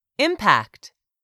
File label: impact